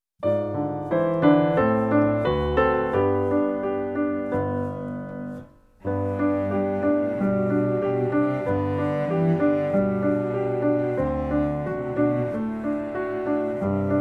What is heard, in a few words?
Long Long Ago Band